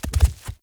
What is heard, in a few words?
Wolf Steps Land Default; Animals